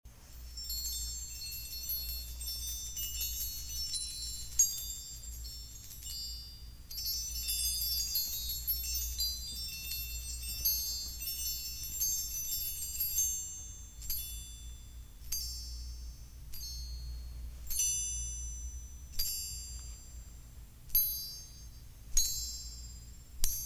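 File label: fairy flies and rings a little bell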